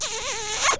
long kiss; creatures; sasquatch

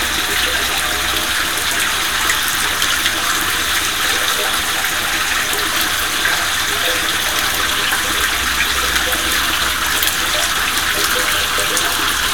running water (cave); environment; sasquatch

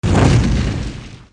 supertank plazma fireball; modern weapons; golgotha

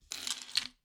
coins pickup

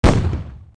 tank, modern weapons; golgotha

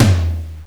low tom, instruments

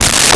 coarse gravel, footsteps; creatures

